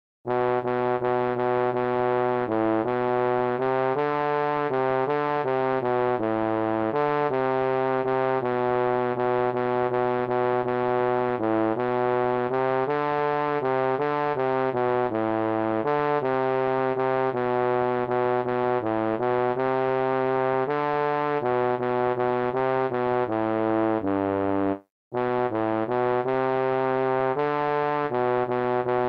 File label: Afghanistan National Anthem on trombone; 1926-1943